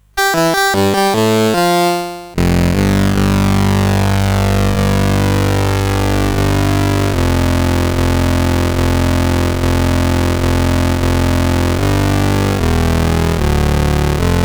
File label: Suspense, CSG8580R5